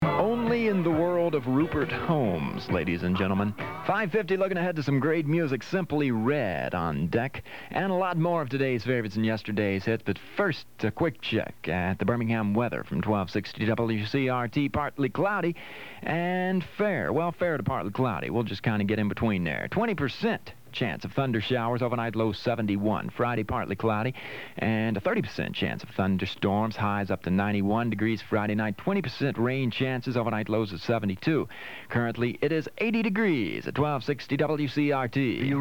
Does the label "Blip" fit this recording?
No